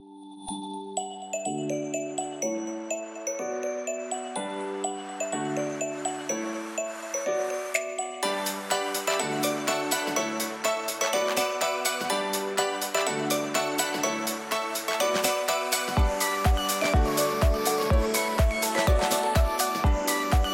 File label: rain beach instrumental